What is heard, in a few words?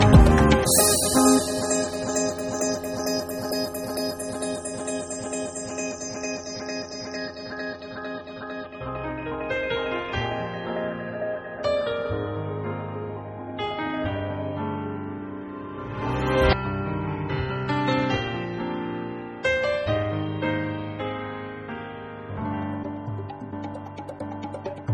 string